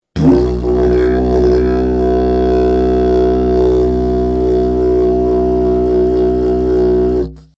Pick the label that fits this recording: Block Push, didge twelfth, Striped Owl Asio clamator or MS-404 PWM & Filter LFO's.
didge twelfth